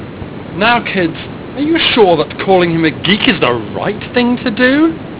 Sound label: Shot; Captain Correct